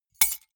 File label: key ring large drop stone hard